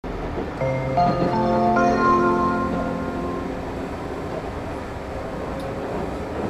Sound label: ae100 chime; keiseidentetsu